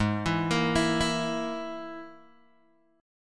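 Ab Suspended 2nd Sharp 5th chord, Guitar, DADGAD tuning; arpeggiated; 6,7,8,9,7,x; JGuitar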